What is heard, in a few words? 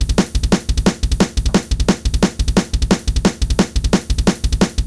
Drums; deadfoot drum training